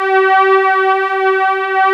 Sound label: C5 PAD, MOOG; USB Soundscan vol.49; Analog Fantasies; AKAI